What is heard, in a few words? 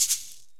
PERC & KALIMBA